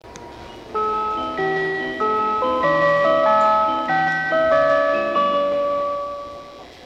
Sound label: chime; wakayamadentetsu